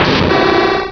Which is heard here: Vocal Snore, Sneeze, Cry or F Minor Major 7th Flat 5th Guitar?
Cry